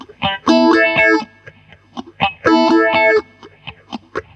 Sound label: GTR, Roots Disco; USB Soundscan vol.32; AKAI